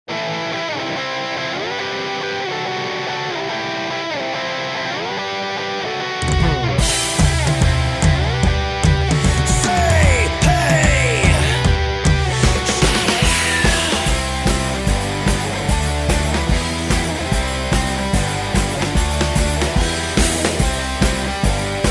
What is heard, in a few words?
Cover Band